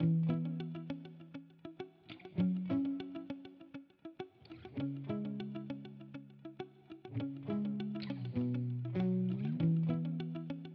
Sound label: Guitar Loop, alternative; 99Sounds x Monosounds; Instrument Loops; Guitars